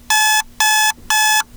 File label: smg empty alarm; Weapons; Guns